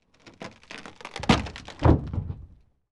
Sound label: wood crack thick splintering bursts creaking; prosoundfx